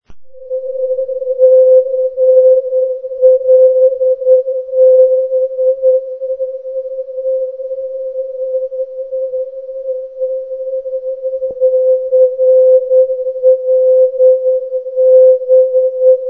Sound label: cw